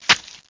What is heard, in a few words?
SPLUT; AB3DII